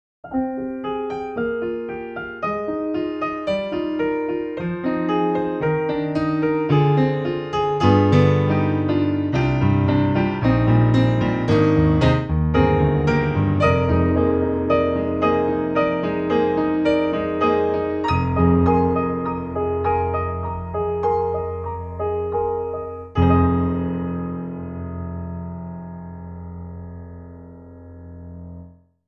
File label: oldlady on, piano